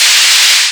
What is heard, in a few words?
car brake; vehicles